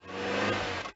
car acceleration; traffic command

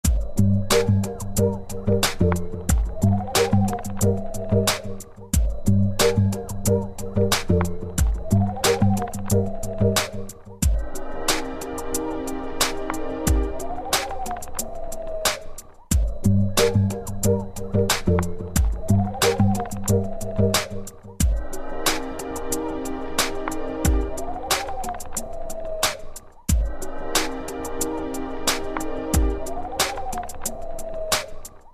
menu; indie cannon

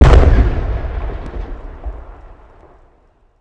explo classic; jedi training

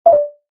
nav tap; gradient

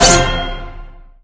combo; fruit break3

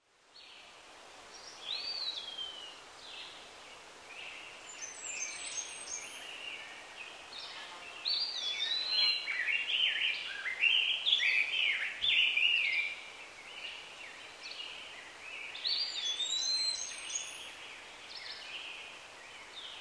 forest back; rabbit samurai 2

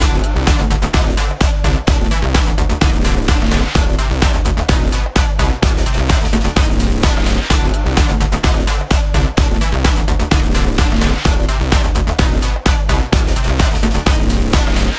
menu loop; wrestle jump

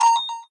timer beep; traffic command

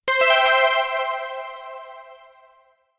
complete; candy blocks

